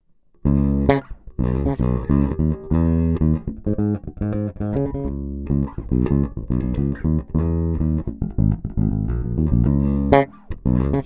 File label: fender deluxe passivo mid, baixo